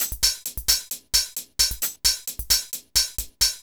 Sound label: HiHat Loop, Dance Beats